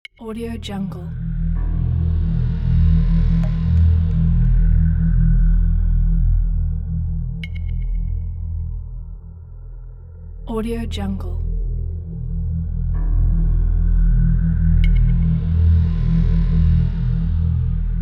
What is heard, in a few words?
drone